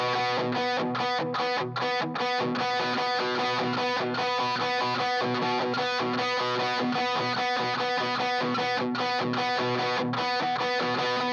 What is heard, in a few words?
rhy g sample